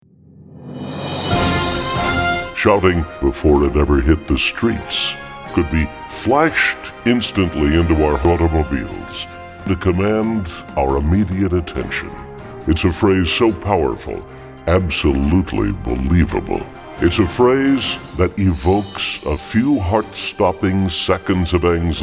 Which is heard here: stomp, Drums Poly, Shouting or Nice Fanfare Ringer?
Shouting